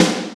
SPD-8, DIGITAL

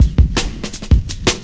Drum Loops, Jungle

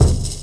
hit, PERC; Techno Trance Essentials

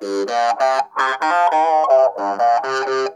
NOTE BOX, Funk Guitar; 90 s Sample CDs; Zero G

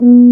BRS F TUBA, LOW BRASS; Roland - LA Composer vol. 1

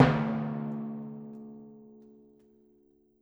Timpani, Orchestral Percussion One Shots; Sonic Foundry (Sony Creative Software); Orchestral VOL-2

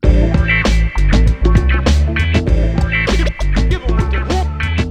99 BPM, Hip Hop; InVision Interactive; 40 Oz Phat Slammin

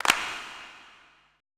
E CLAPS, ETH PERC

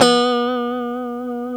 C 4 FUNK POP, GUITARS, FUNK STRAT; Spectrasonics; Hans Zimmer Guitars VOL-1

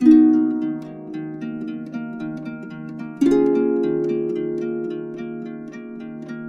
110, Complete Harps; USB Soundscan vol.62; AKAI; PRECI 110

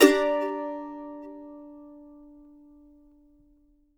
mando min; Sampleheads; Whole Lotta Country 1 & 2

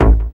SEQ, Synth Bass, Roland

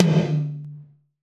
Tom, Gated Reverb; creative gate; musicradar

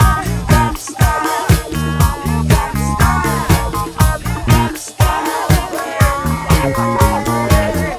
DISCO RIDE; USB Soundscan vol.32; Roots Disco; AKAI

